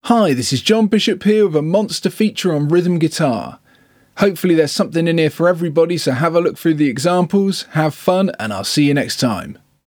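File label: Rhythm Guitarists intro; Guitar Techniques 2018 Issue 289 December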